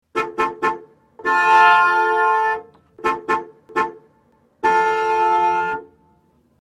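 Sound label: 3d horn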